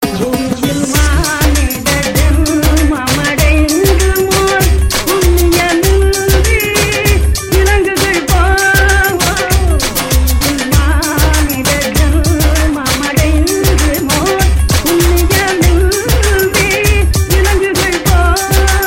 Tamil Remix

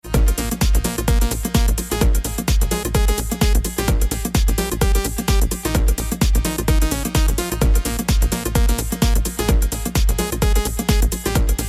progressive melody

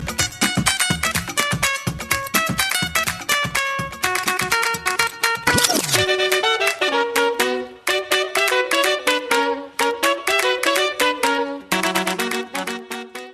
Cha Cha Msg

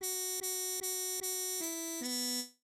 Trumpet Tune

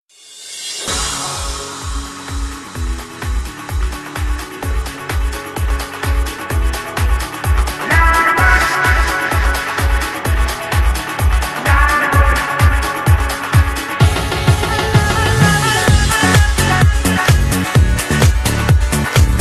Samba

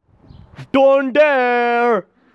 Dun Dere

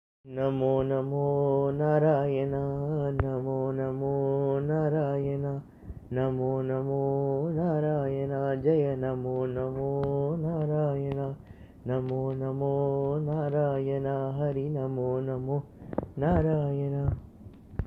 Namo narayana bhajan

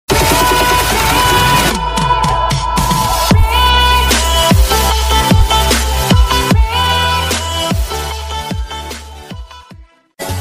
ring

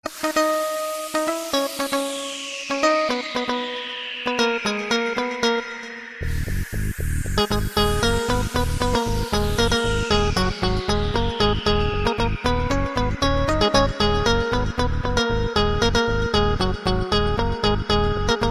Old Hindi Music

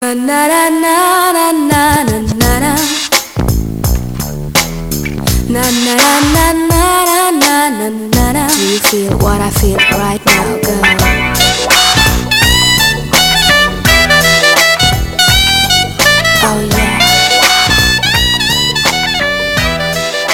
Telugu; Gharshana

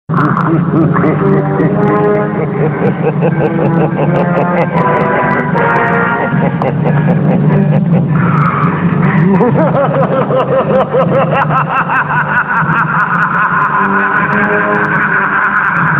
Dangerous Laugh

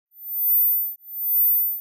Quirky Cricket

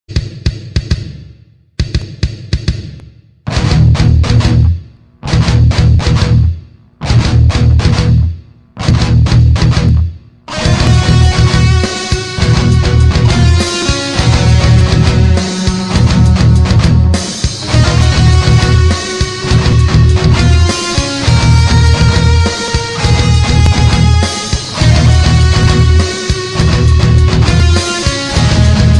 Metal; Terminator

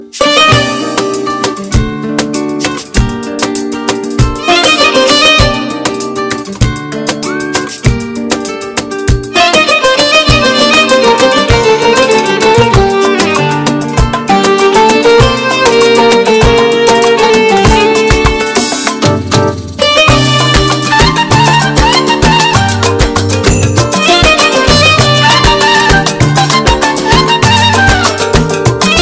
Instrumental

